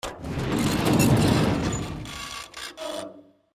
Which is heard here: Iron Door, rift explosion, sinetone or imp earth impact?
Iron Door